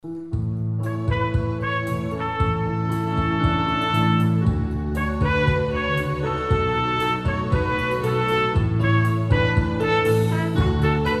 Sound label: Piano e Cordas